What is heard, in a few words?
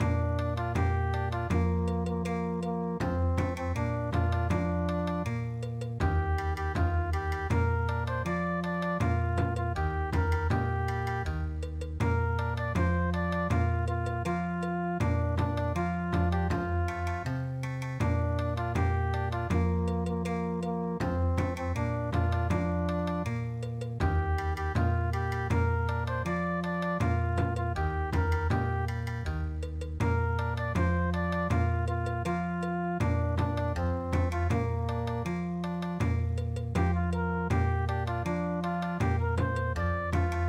Bon Odori